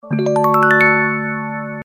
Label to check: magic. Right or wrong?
Right